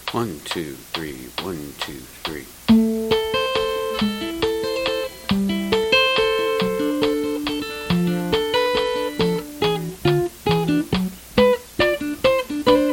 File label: intro guitar